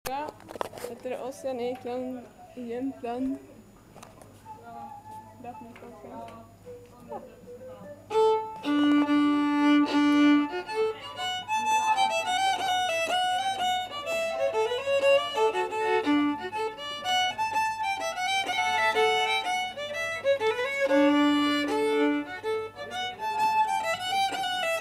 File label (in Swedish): Polska; efter Ossian Eklund